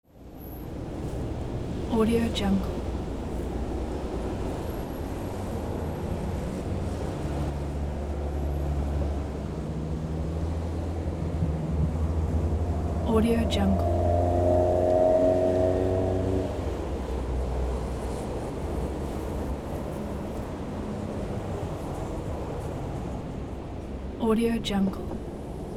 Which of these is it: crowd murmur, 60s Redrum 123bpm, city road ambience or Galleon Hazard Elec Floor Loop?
city road ambience